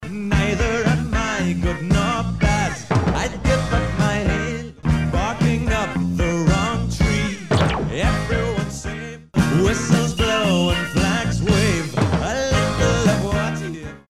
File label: 1 beat bar effects